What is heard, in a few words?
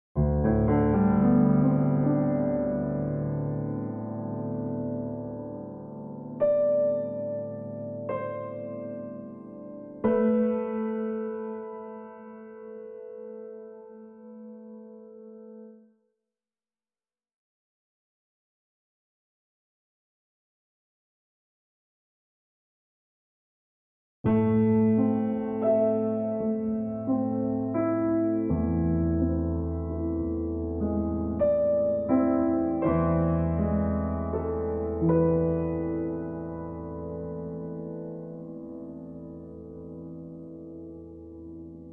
Sadness Piano